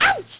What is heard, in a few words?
lofi sega sample; Lofi Sega Samples